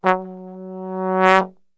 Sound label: F#1, Brass; Best Service; Brass Super Section